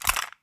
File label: Cassette Rattle